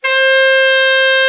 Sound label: ALT, SAX; Best Service Gigapack 1